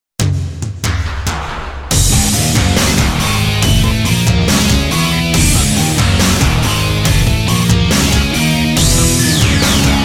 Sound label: JINGLE, SLOW; Shadow The Hedgehog